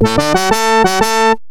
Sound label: Fanfare; Arch Rivals